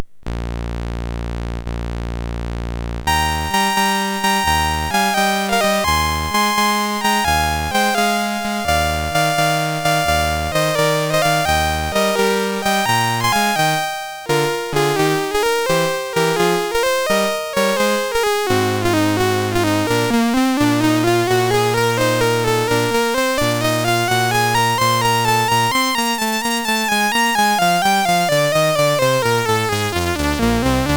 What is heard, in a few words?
Opening Chorus of Prirates and Solo, MOS6581R2; Pirates of Penzance